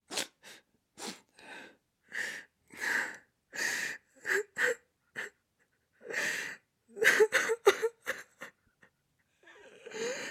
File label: Female Sobbing